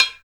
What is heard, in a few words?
RESOSTIK MP; TEK PERC2